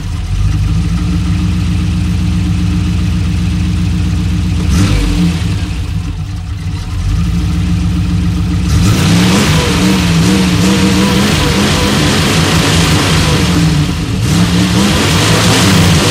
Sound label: Land Rover 6 Wheel V8